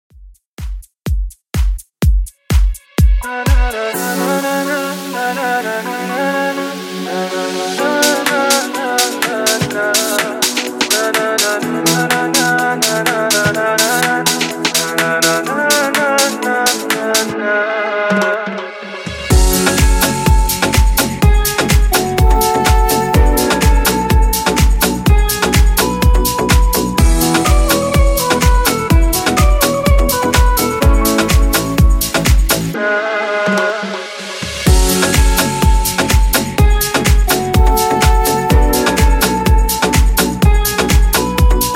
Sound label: Club House 125 Bpm Clean